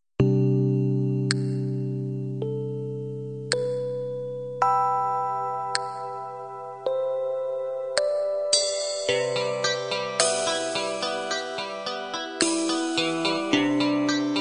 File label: halftime ballad end